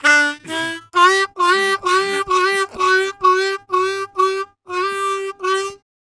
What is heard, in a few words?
Harm 129bpm G, Harmonica